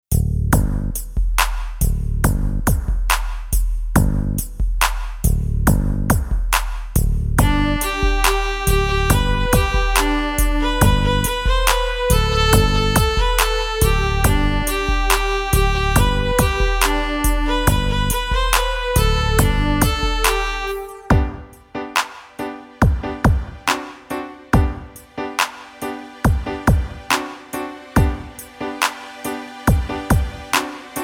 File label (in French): VERSION KARAOKE